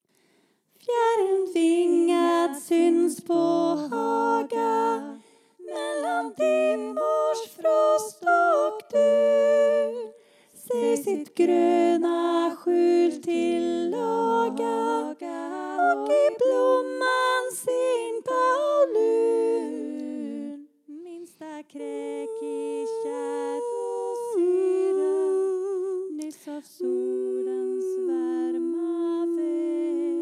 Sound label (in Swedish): fjäriln vingad Sop